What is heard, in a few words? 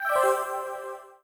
Mail Sent, Notification; microsoft office mac